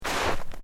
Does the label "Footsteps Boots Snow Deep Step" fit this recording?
Yes